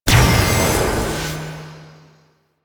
Infested Ancient Healing Healer Pulse

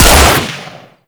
Magnum Shot; Warframe; Tenno

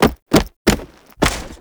Jump Climb; Orb Vent Finisher; Warframe; Venus; Orb Heist; Cinematics

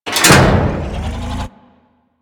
Injection Chamber Tube Mover Start; Ambience; Warframe; Grineer Ocean; Ocean Sabotage Injection

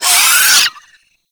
Arm Vocal Wind Up; Enemies; Warframe; ManInTheWall

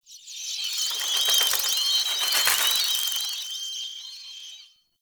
Bat Stinger; Ambience; Dagath; Warframe; Clan Dojo

